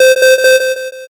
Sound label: alarm